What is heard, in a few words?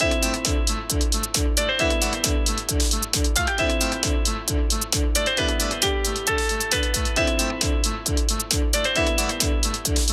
banjo